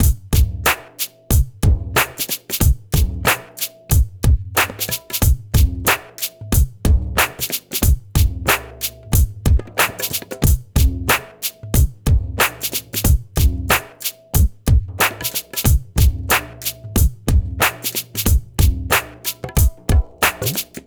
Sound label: mix 092, Hip Hop India 092bpm; Hip Hop India Modern Percussion